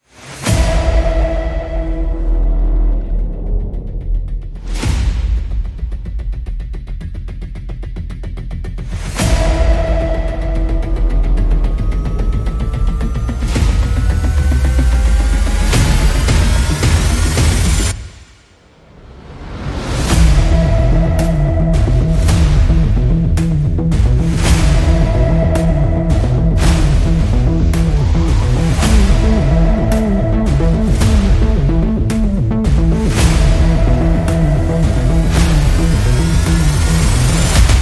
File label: Epic Music instrumental